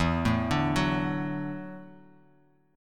E 9th Suspended 4th Guitar Open F; arpeggiated; JGuitar

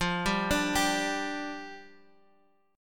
G 5th F, chord; G5/F arpeggiated

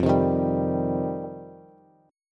F 7th Suspended 2nd, Bass; F7sus2; strummed; JGuitar

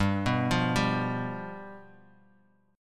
G 7th G down

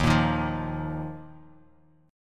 D sharp Suspended 2nd; strummed; JGuitar

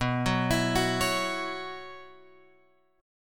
B Suspended 2nd Suspended 4th; Bsus2sus4 Chord {7 9 X 9 7 9} arpeggiated

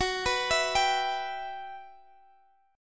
E Minor F sharp, chord; Em/F#; arpeggiated

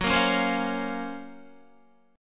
F sharp Major Sharp 11th F sharp, Guitar; F#M#11 Chord; strummed

